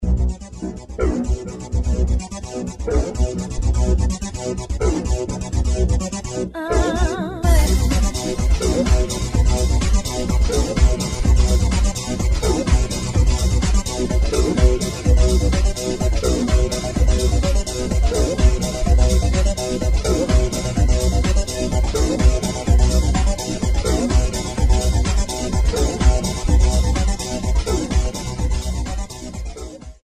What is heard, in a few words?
Funky Filter Trance Party